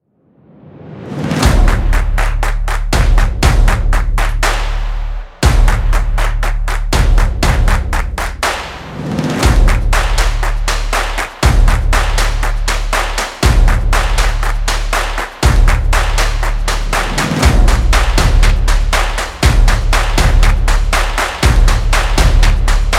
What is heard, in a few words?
Intense Stomp And Claps; energetic; energetic percussion music